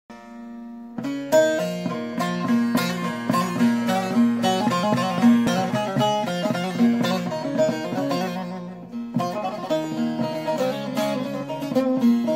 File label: baglama; ساز باغلاما